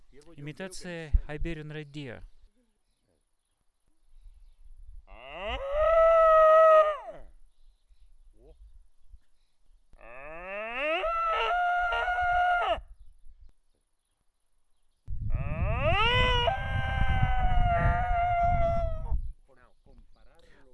Cervus elaphus hispanicus man

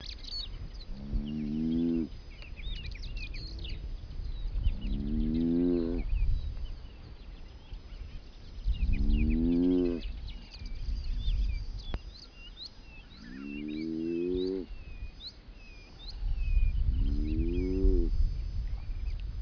Pyxicephalus adspersus male

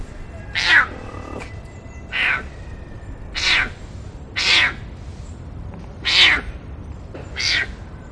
Felis serval

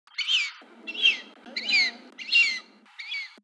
Dendrocygna bicolor females

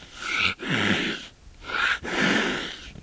Panthera pardus threat

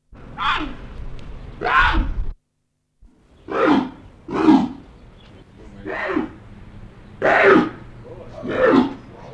Panthera pardus sad

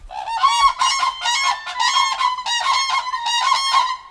Grus grus duet

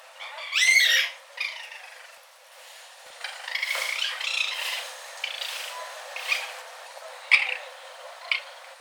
Procyon lotor

